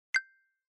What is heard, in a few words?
private message